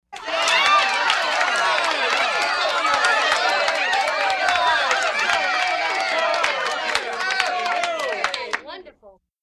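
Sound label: Excited React Applause; medium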